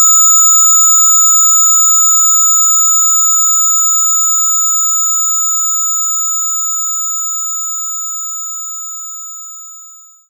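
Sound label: Glockenspiel Loop C4; knights try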